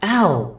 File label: attacked; rosalina; SM64 CO-OP